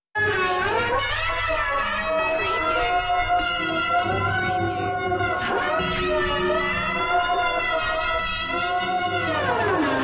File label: disharmonic ghost orchestra